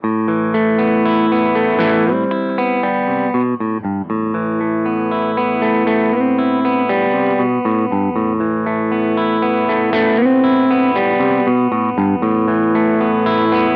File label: Godin Radiator Burst crunch both bright